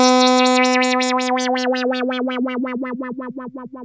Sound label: 2600 B3, ARP 2600; Hollywood Edge - Giorgio Moroder Rare Synthesizer Collection